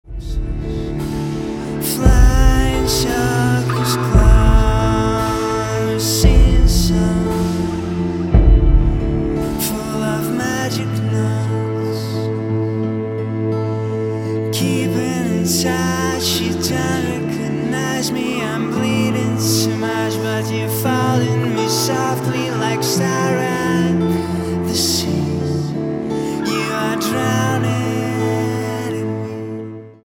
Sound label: funeral waltz